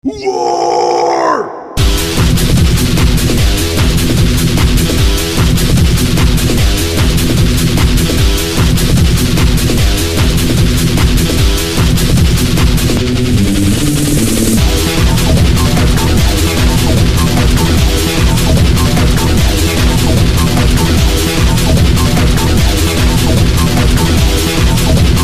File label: Fight; Serious Sam